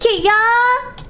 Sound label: kiyaaa